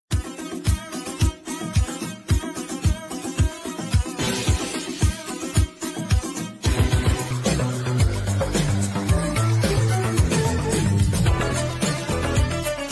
Egyptian yellow cat dance; animal crossing

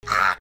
star noise

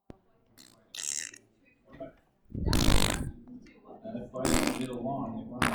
savage fart noise

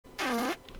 wood fart

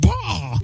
bwah

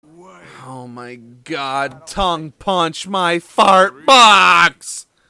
fart box

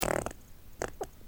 funny fart

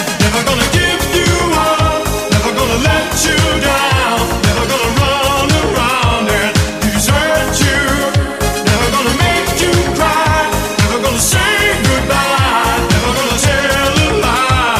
moan noise

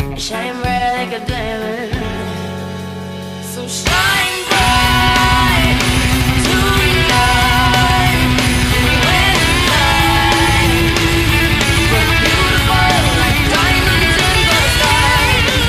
country surf metal version

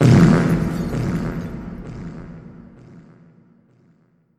giant fart

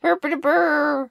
burpity burr

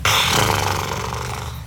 lips

